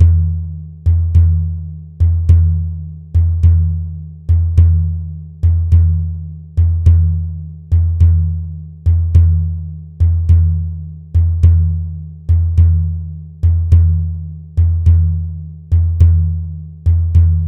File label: Medieval Handdrum 105 BPM